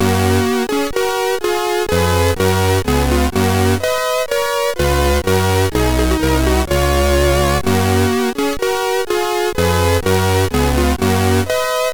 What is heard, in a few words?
Hymne, sid CSG8580R5